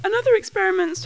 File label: harmonics